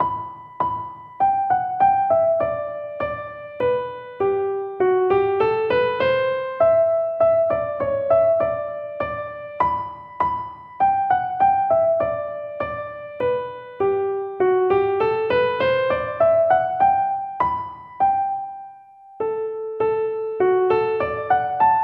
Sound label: Bluebell Polka